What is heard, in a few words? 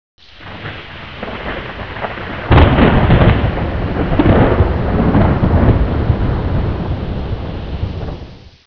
thunderstorm; T.J. Finds a Friend